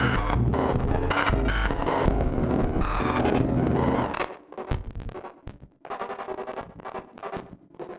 Battered Synth; malfunctioning synth samples

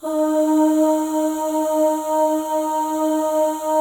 OH, FEM, Symphony of Voices; Spectrasonics

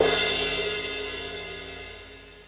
Crash, Drum Machines E-MU Pro-Cussion

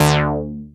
ARP 2600 D4, synthesizer; Hollywood Edge - Giorgio Moroder Rare Synthesizer Collection

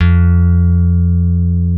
F2 F.BASS; Keith Emerson Signature Series

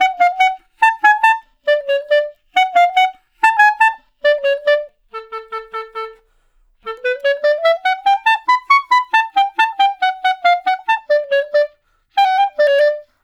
Clarinet One Shot, melody

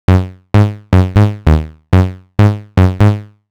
Bass, 130bpm, Nu Dance Electro; Zero-G; Soundclash; Combi Loops; Chicago Jock

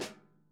tap, Vintage Timetraveler kits; Sonic Reality - Vintage Timetraveler

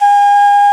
SOPR. BAN, Bansuris; Winds; E-MU Producer Series Vol. 7 – Old World Instruments

